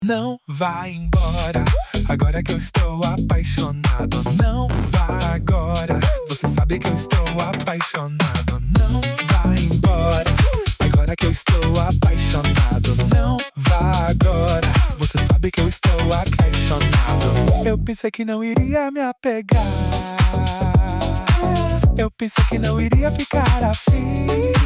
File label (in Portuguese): remix pop